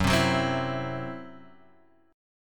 C-Major Flat 5th F sharp, guitar; strummed

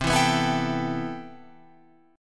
C Major 13th C down Guitar Open F; CM13 strummed; JGuitar

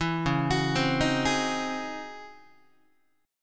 Db-Minor Major 11th Guitar, DbmM11 chord; arpeggiated; JGuitar

